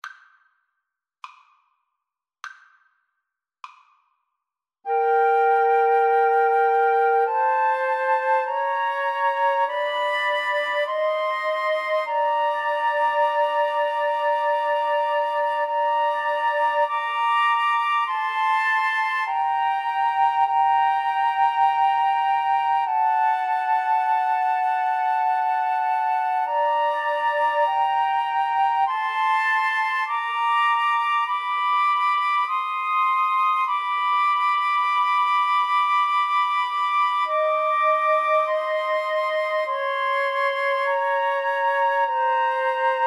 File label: Flute Trio